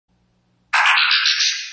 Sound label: Transform